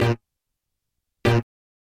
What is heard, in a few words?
Horns